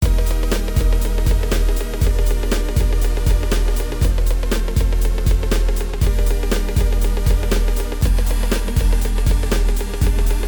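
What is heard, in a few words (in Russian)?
циркуляционный танец церемонии награждения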